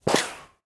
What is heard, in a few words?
heli fire impact; Helicopter